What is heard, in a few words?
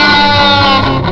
PITCH guitar; GUITARS KIT